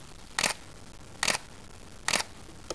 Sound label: Canon EOS 1D Mark II